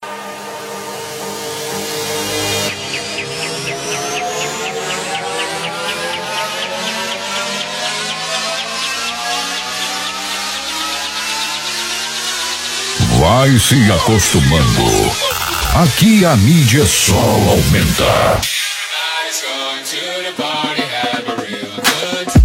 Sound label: Deep Car